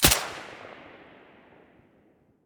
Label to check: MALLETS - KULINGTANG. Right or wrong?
Wrong